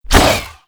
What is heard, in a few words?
Pox Star Whoosh; Warframe; Infested Throwing Stars; Coda Pox